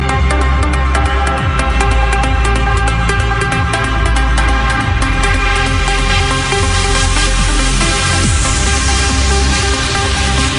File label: trance anthems, ringtones